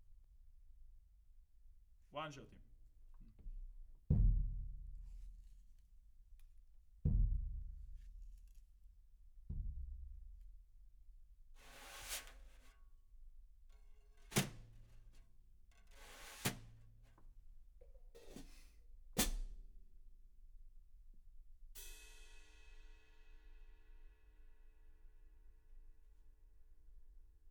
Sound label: Kit